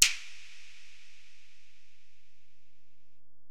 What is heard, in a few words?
HiHat, Alesis DM-PRO